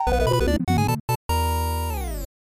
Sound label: Lose Life; super bomberman 3